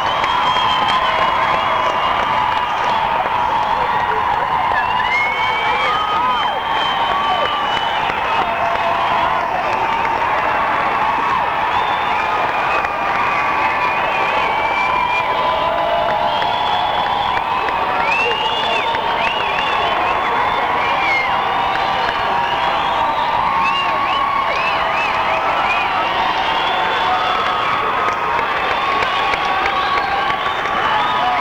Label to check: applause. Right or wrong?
Right